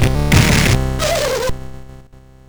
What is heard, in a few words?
MOS6581R2, sid